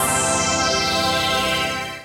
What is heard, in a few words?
PAD